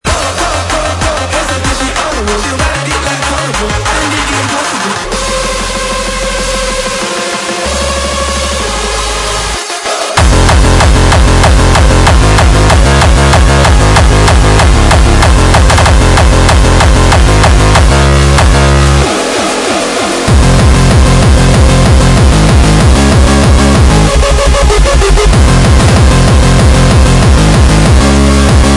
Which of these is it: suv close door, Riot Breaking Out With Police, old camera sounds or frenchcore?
frenchcore